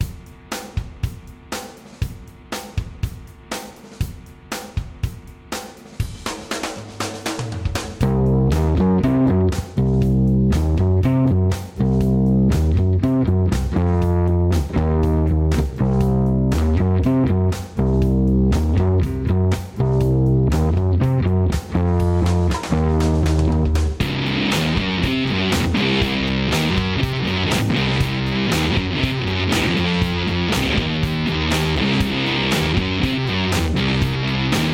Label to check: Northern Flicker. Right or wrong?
Wrong